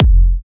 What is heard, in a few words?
Punchy Kick